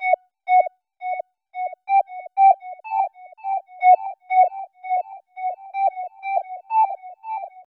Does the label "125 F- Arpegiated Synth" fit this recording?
Yes